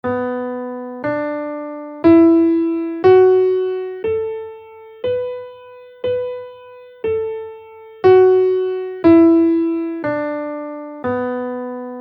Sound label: minor pentatonic scale in Bm